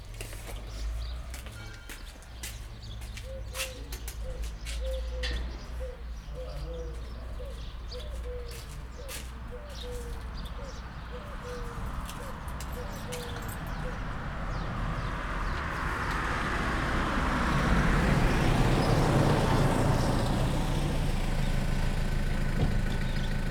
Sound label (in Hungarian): érkező autóból kiszállnak, jó napot, bégető juh; Felsőtelekes; személygépkocsi